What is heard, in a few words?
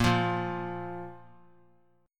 Bb 5th Bb; Bb5 strummed